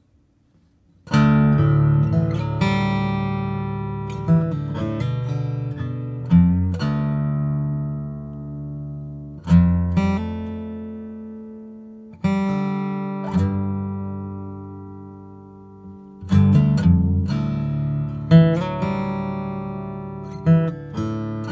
music forest

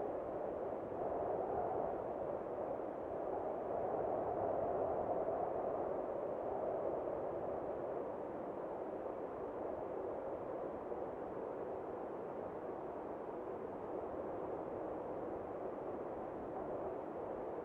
ambient snow

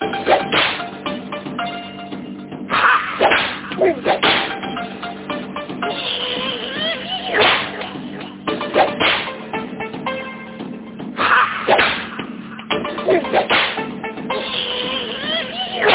HongKong Action

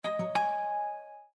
Windows Print complete

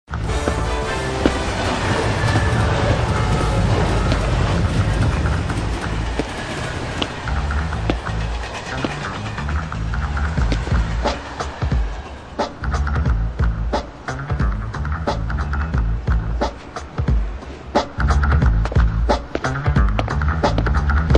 Instrumental

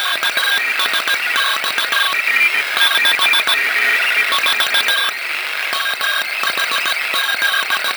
shortwave

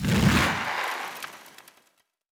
Empty Recycle

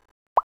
On add item

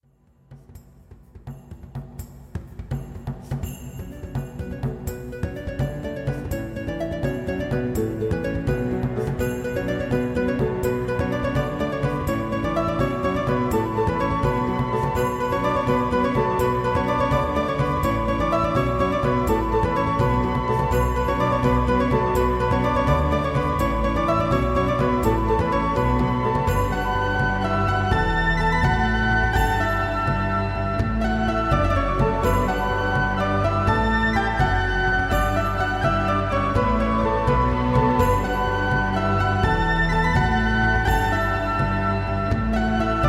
Celtic Music

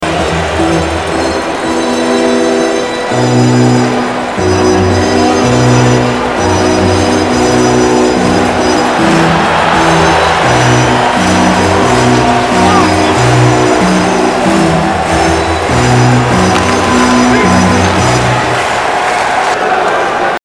ORGAN KALINKA; OLYMPIC

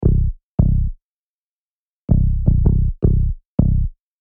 Stinger

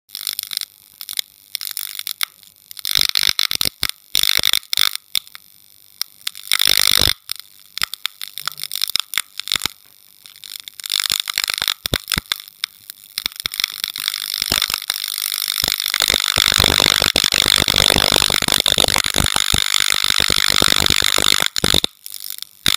Light Flicker

